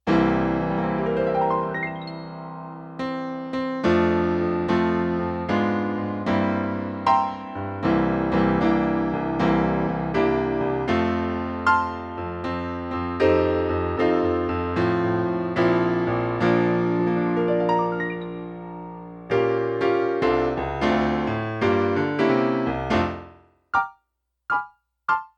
piano

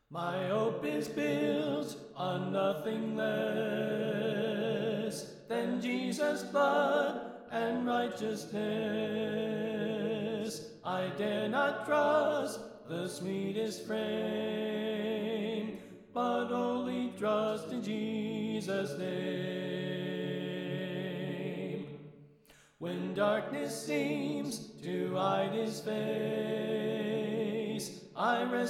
Tenor Left

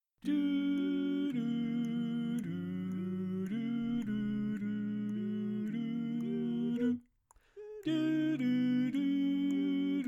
lead; Pennsylvania Groups; NBC

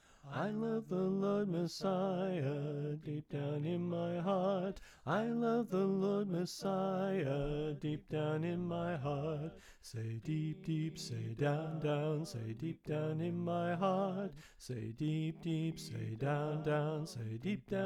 Soprano Left

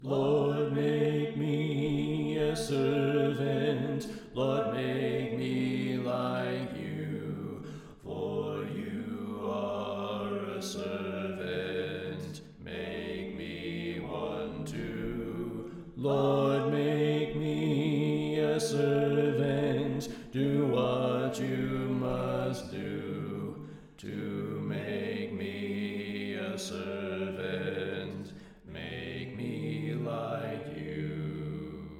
Bass Left

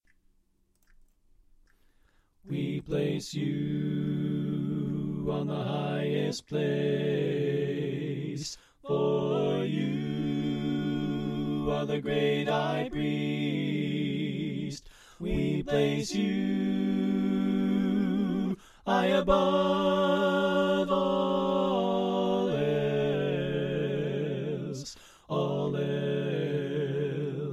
Bass Left

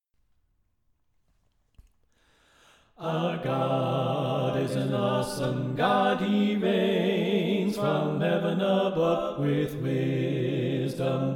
Bass Left

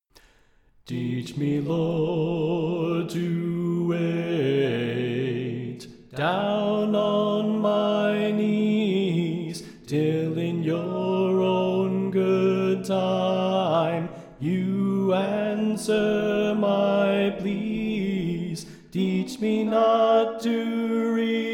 Soprano Left

